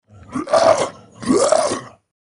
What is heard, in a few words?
Nasty Troll Noises; Monster Sound Effects